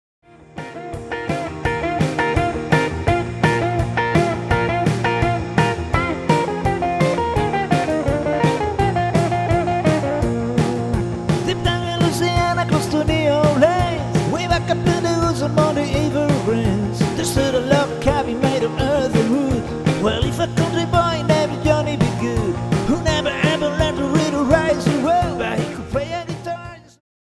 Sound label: No Bass